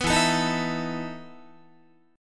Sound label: E Major 7th Suspended 4th E down Guitar